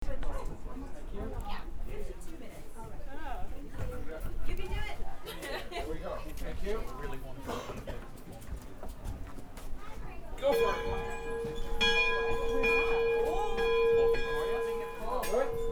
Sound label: bell